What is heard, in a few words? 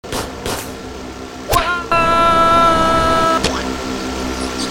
Slingshot